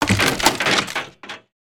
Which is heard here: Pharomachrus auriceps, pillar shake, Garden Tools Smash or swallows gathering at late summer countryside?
Garden Tools Smash